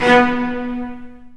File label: Cel Spc B1; Digital Sound Factory Virtuoso